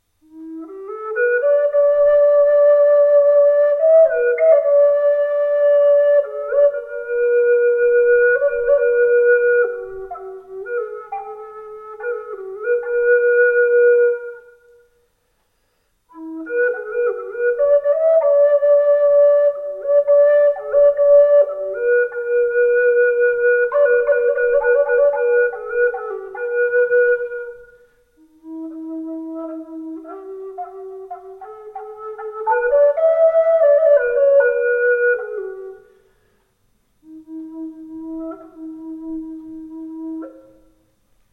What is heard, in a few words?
native in E